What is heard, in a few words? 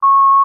Beeps Single Tones Sh